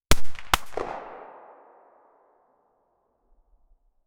single revolver gunshot; firearm

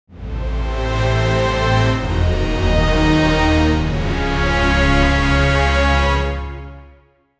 epic royal soundtrack wit

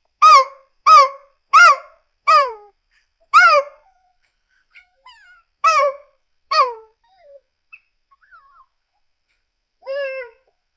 baby puppy barking soft; childlike

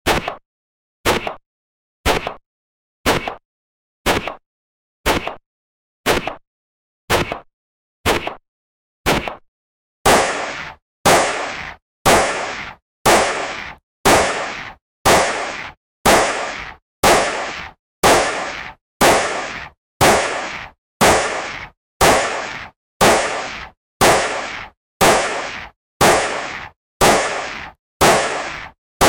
differents gunshots sound

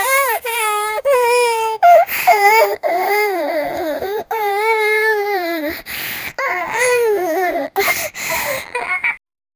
very loud emotional cryin; emotions

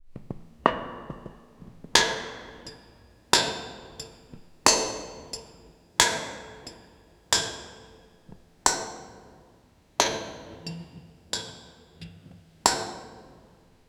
rhythmic footsteps of a, boots

